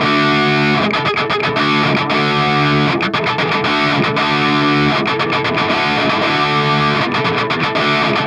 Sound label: Guitar D maj, Rock